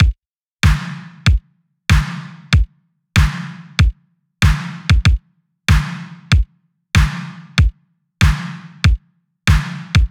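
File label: Synthwave Kit 95bpm Stripped, Drums; Drum Kits; Stems; Ghosthack - Ultimate EDM Drums; Synthwave Kit 07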